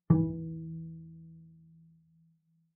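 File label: Bass pizzicato fortissimo sul D E2, Double Bass; MIS Pitches - 2014; Strings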